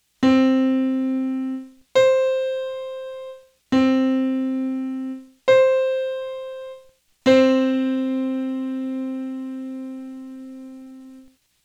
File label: Not Octaves, Pitch; Music by the Numbers